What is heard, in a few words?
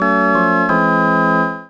lose; dodo